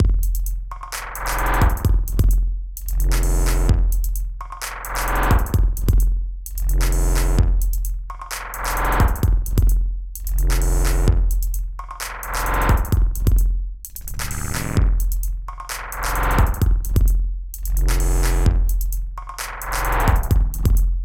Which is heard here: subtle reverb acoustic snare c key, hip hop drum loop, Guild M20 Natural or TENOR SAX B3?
hip hop drum loop